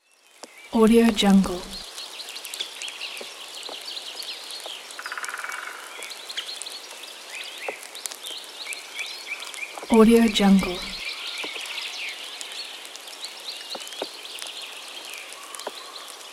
nature ambience birds forest light rain

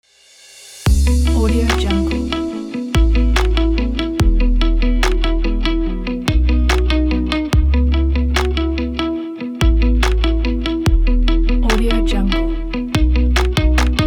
future bass uplifting

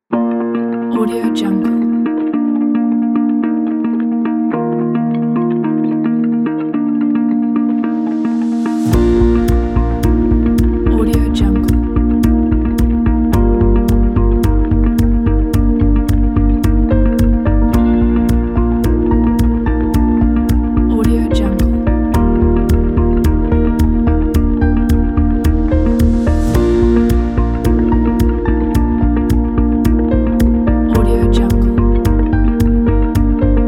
the ambient music

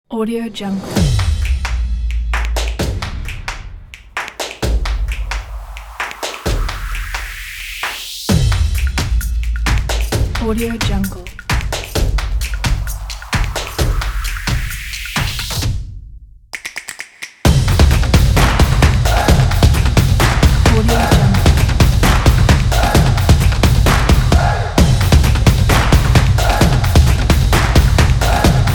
the drums

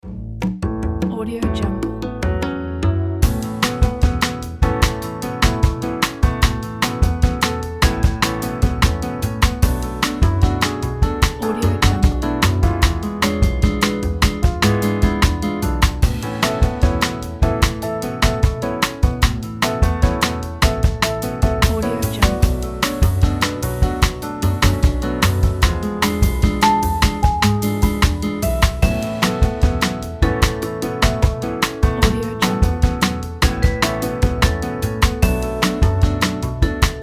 ambient light lounge

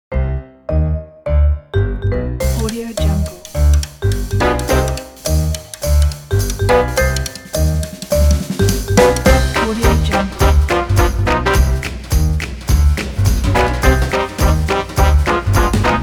upbeat jazz orchestra

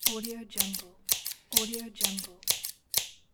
scissors multiple cuts

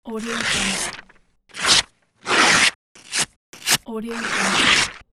paper tearing; bekaproduction

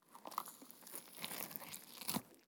flesh tear

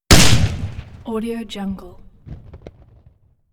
shotgun blast

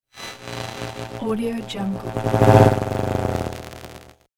electric glitch

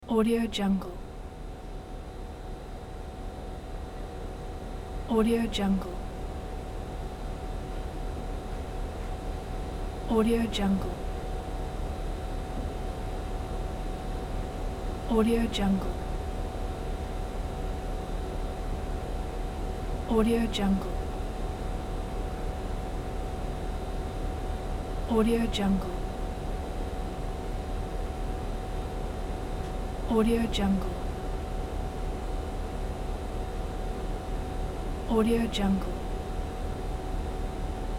train station train idle engine roomtone; cinematic sound design

